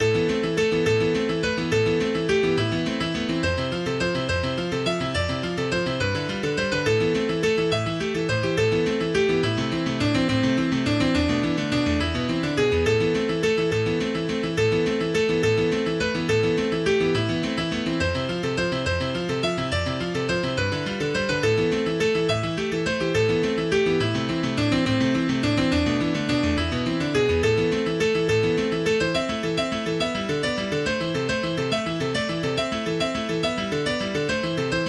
The Piano

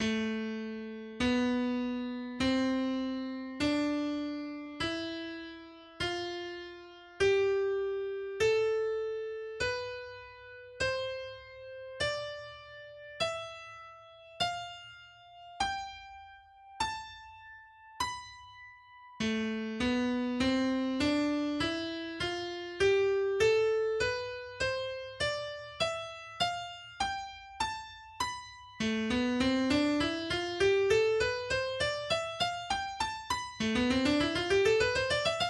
SCALE TEST

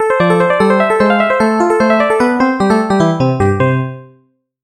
Boss Battle Victory

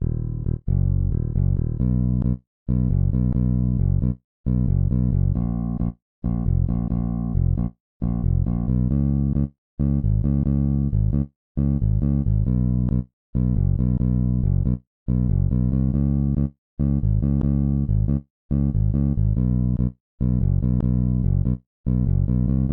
Bass Jam

